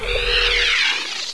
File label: physgun off; Source Engine; video game; weapons